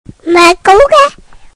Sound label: microwave